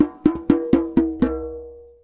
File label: Djembe Fill; percussion; musicradar